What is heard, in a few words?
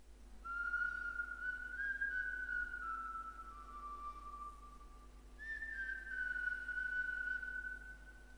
Distant Whistle